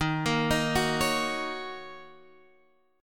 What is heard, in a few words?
D sharp Minor 7th D sharp, down, Guitar, Standard; arpeggiated; JGuitar